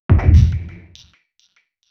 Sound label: powerful outco, audio branding